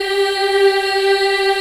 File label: G3 child eh, Choir; USB Soundscan vol.28